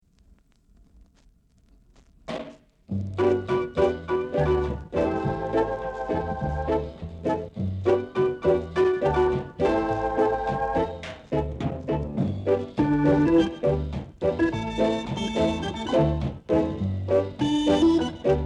groove

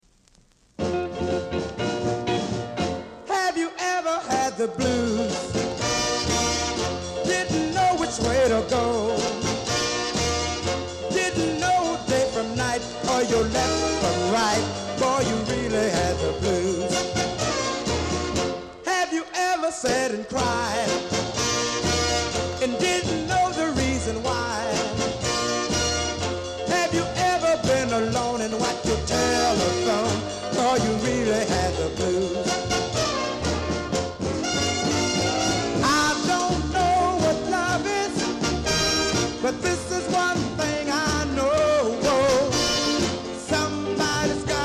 blues

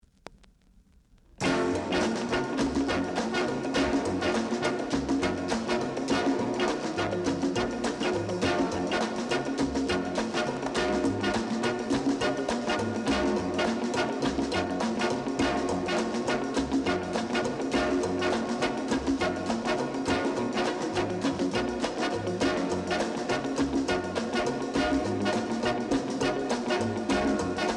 jazz

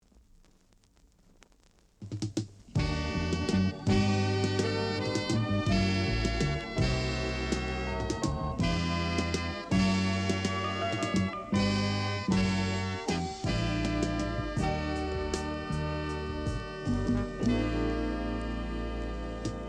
funk

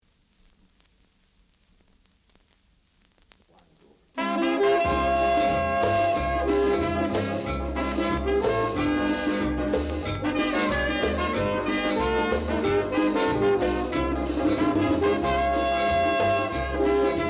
piano

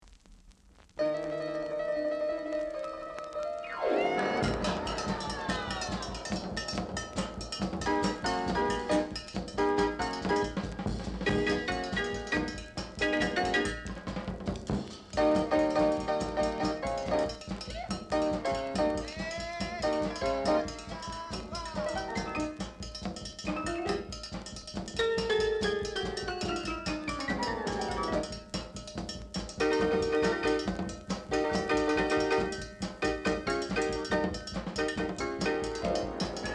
drum ambo

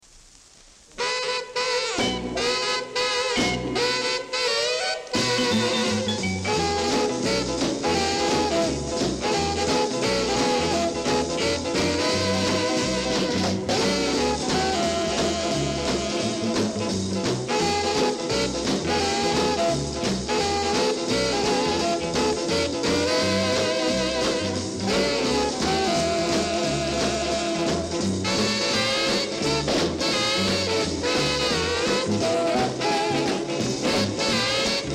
pop

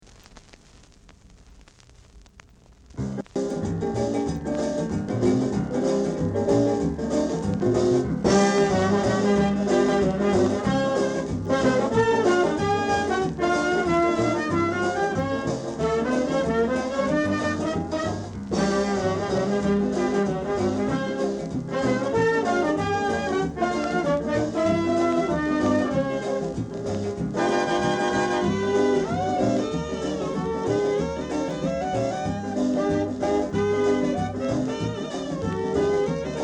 melody

